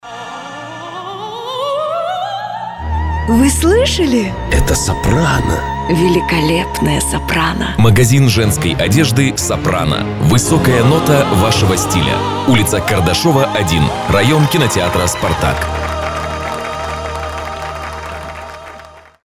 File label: soprano